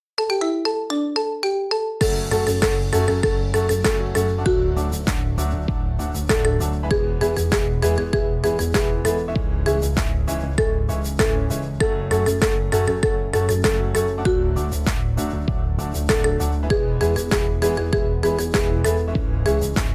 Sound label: Marimba